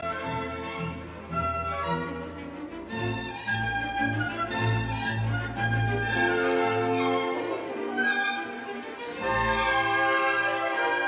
Symphony in A major, Scherzo Nicht schnell Trio Langsam; Symphony No 6; WAB R Haas